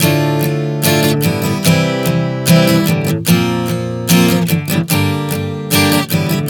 Progression 074 Dm-C-Bb-Gm, Acoustic Guitar, Chord Progressions 074 bpm; Sonic Foundry; Songwriter Acoustic Guitar Companion